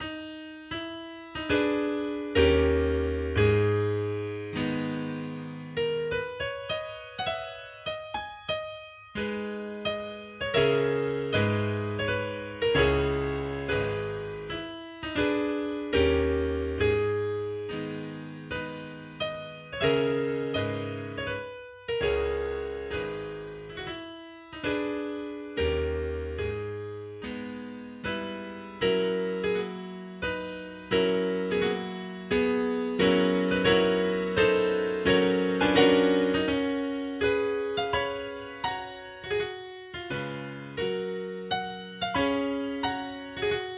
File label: Mazurka in G Sharp Minor Mesto